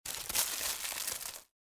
hieu ung am thanh va cham tui nilon

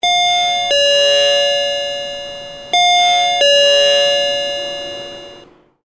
tieng bam chuong cua 2 lan lien tuc

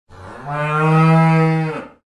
am thanh con bo tao ra moo moo

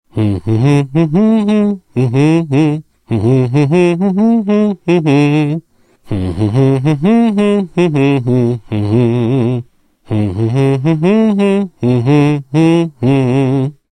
tieng nguoi dan ong hat ngheu ngao

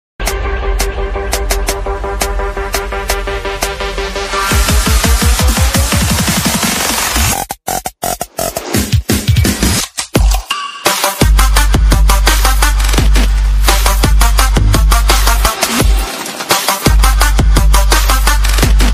nhạc intro 30s không bản quyền

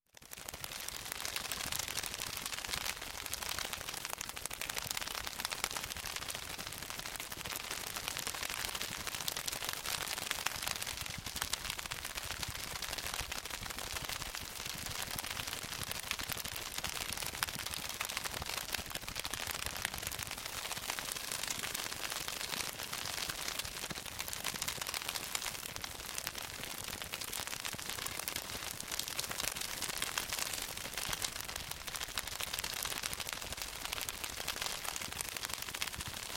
tieng canh cua vai con doi

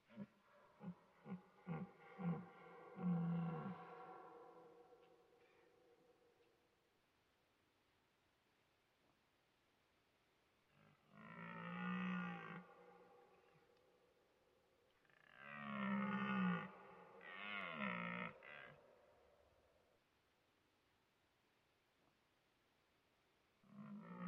wapiti loai huou

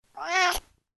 tieng meo keu khan khan

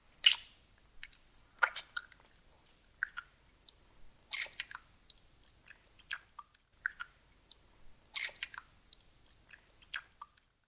am thanh tieng hon luoi cua cap tinh nhan

